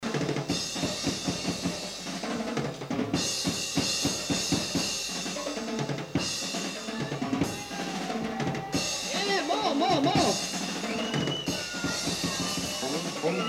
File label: Drums; Drums solo